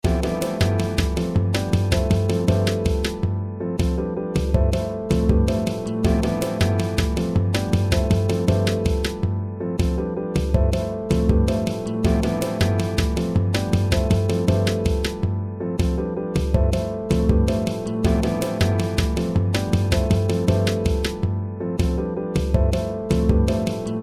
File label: drum line